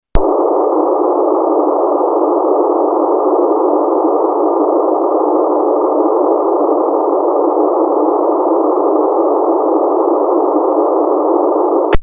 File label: nxdn raw, DSD decoder